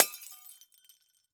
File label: Crash Glass Window Pane Breaking; crashes; AdobeCC